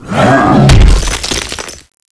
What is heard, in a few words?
skill dash finish, meatwall zombie